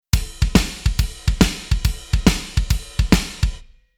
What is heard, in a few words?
Rhythm Metal